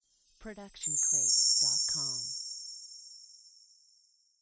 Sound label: fairy dust; soundscrate